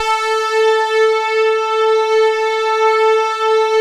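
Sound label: OBERHM OBXA, Oberheim OB-Xa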